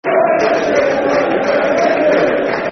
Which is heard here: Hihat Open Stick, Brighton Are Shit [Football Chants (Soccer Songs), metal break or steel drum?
Brighton Are Shit [Football Chants (Soccer Songs)